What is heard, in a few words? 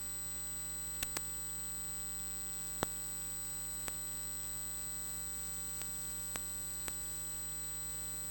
noise